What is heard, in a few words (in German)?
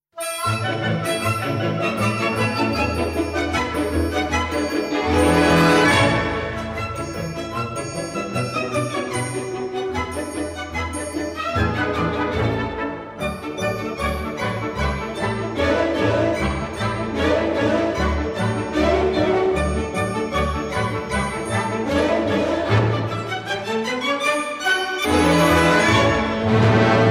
Musik; bauphysik